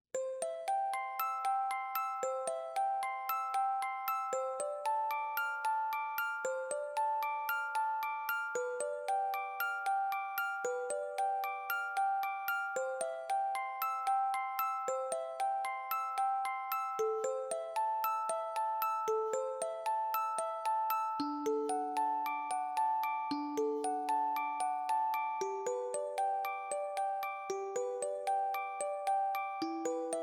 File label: lullaby